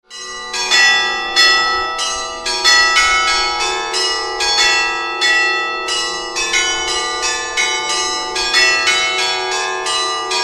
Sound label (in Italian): Campane Oratorio; campanari bergamaschi